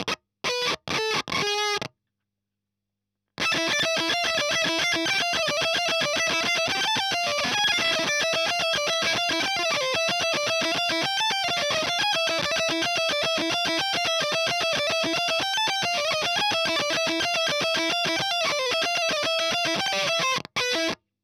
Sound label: EL Fuzz End